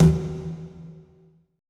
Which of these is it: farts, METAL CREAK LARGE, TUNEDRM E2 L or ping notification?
TUNEDRM E2 L